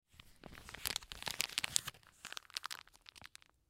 Zip Lock Bag Crumple; Plastic; Blastwave

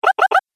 Jump Video Game

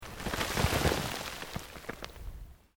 Earth Loose Move Short

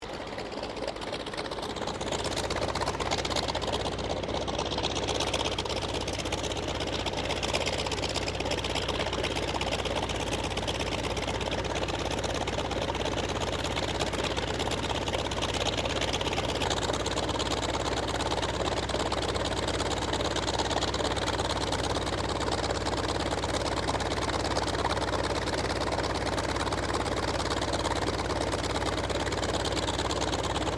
AIRCRAFT T-28D TROJAN IDLE; Airplanes; Vehicles

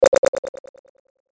Hit Sweeper Delay; Hit Sweeper Delay Sputter; Sweepers; Blastwave

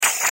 Flash Animation Multimedia

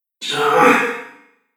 Human Fx Grunt; Grunt Sound Effects

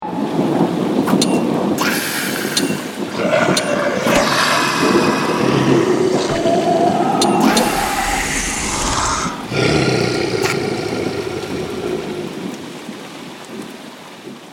Fantasy Battle Scene

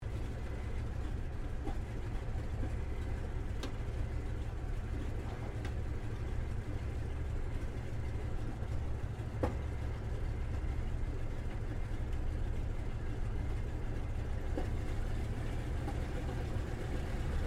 AIRCRAFT CA-28 CERES IDLE INTERIOR; Airplanes; Vehicles

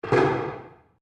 Wood On Wood Table; Material components for constructing more complex sounds; Sound Effects:Materials

